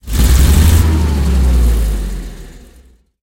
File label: hetzer engine load, tanks; War Thunder